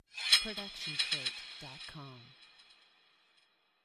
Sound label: horror hit metallic; soundscrate